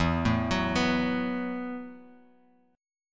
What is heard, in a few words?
E Suspended 2nd Suspended 4th E, Bass Standard; arpeggiated; JGuitar